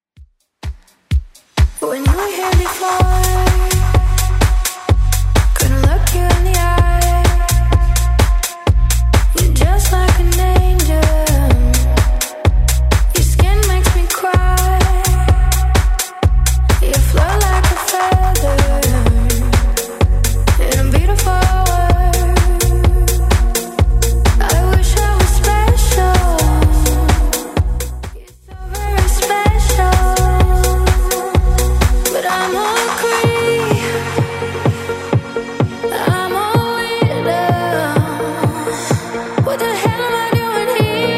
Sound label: 2ks Redrum 127bpm